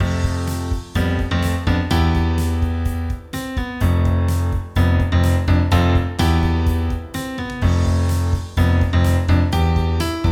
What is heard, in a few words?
Piano; Logic Pro Midi